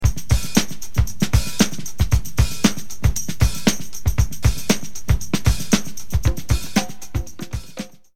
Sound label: beat